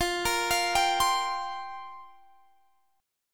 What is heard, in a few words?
F Suspended 2nd Suspended 4th F down Guitar Standard; arpeggiated; capo=3